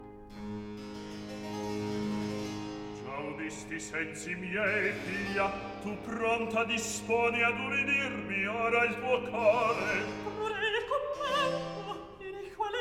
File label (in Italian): Recitativo; Gia udisti; Handel Masterworks; Klassika